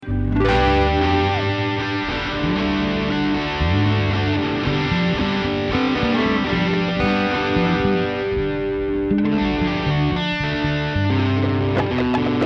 Drum Samples Mix

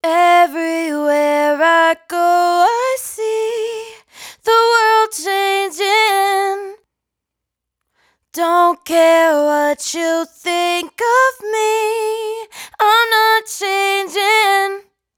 hook 115 Dm, Raw Vocals, Dry Vocals; Everywhere I Go; Slate Digital Omnivox Vocal Sample Pack

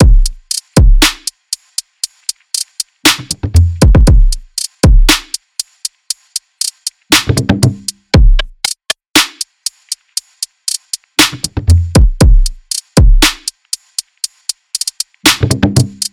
drums 118; Full Drum Loops; drum sample pack; SEISMIC; Slate

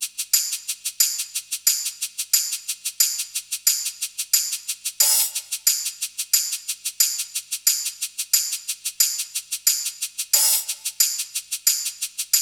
hat 90, Drum Loops, Hat Loops; SD MIDNIGHT Sample Pack; Slate

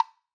woodblock Single Hit, Raw Perc One Shots; Slate; SD SEISMIC Drum Sample Pack

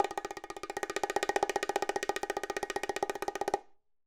bongos Roll High Drum Left; raw perc one shots; SEISMIC Drum Sample Pack; Slate

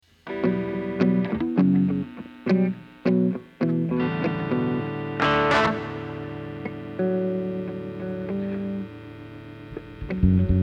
Drum Samples Mix

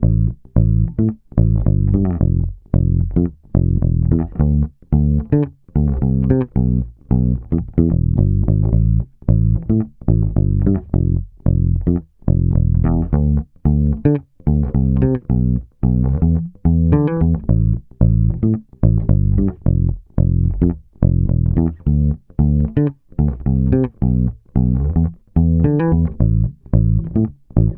Bass loop 110 Am, Electric Bass Loops Direct; Stucco; Slate Digital BASSHOLE Sample Pack

